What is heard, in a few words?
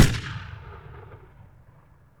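hunting rifle exterior shot, outside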